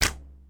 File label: crossbow shoot, backwards; css